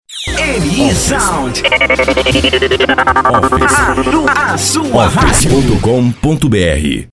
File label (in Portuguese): vinheta